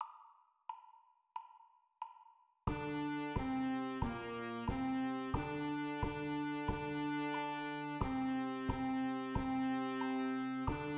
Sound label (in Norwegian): Hornmusikk